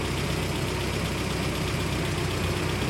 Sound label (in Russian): baryahlyashij nevnyatnij zvuk raboti starogo gruzovika